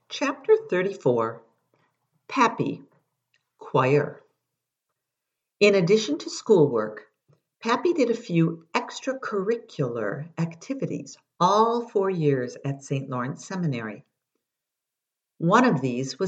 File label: Choir